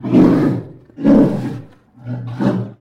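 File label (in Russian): медвежий устрашающий рев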